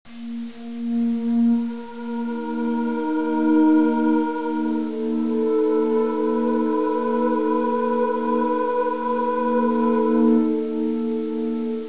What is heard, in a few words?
scary music